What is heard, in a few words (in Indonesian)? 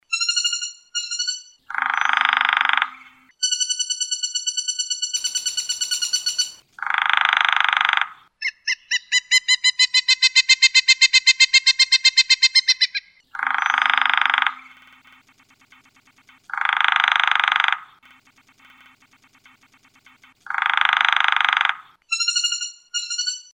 kombinasi pelatuk